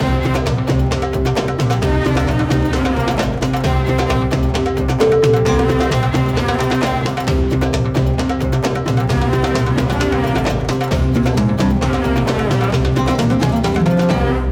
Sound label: epic middle eastern